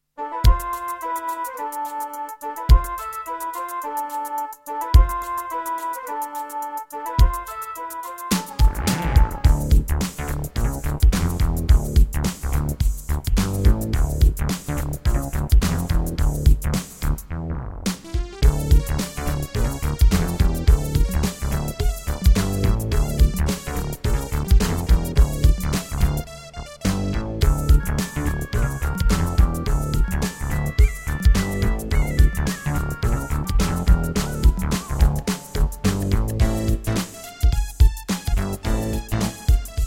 Egypt, Midiplus MiniEngine USB; Lost Vikings

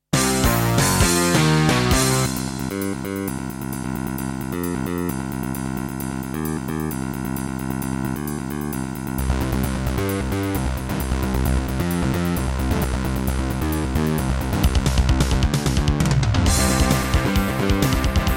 Roland SC-55; sigil doom episode 5

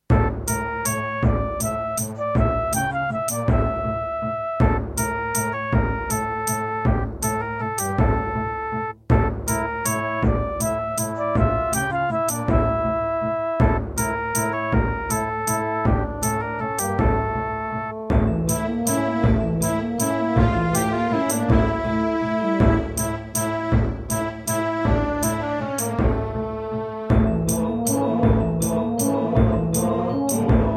Gallant GMW-1000

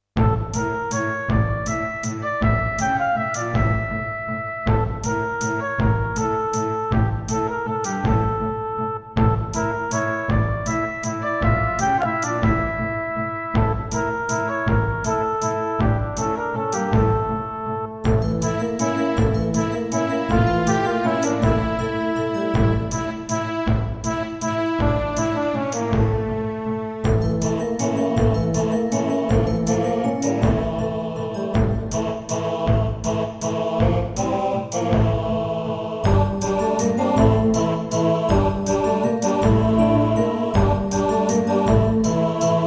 Roland SC-88 55MAP

